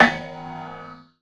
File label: Percussive Hit, contact mic